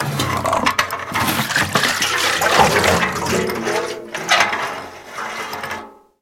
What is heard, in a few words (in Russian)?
dolgij gromkij shumnij grohot metallicheskih predmetov